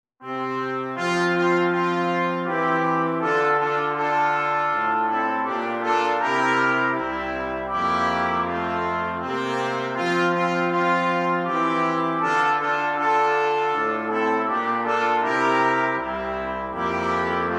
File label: with TRAD intro BRASS; brass quartet; o christmas tree